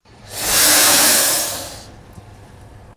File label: smooth burst of steam from an iron; air